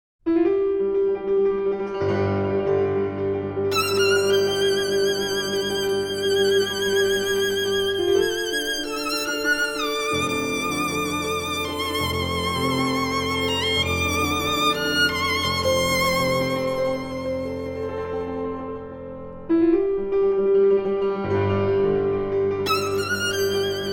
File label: Violin; The Apricot Tree; Arr. A. Gabrielian For Violin and Piano